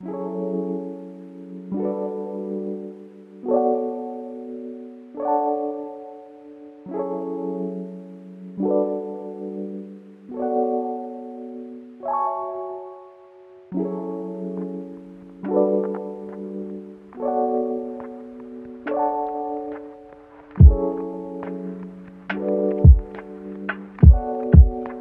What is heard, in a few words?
jazzy